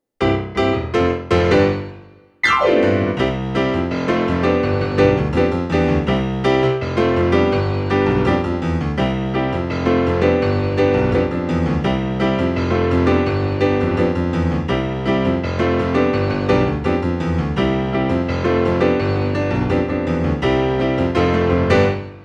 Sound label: La Bamba accompaniment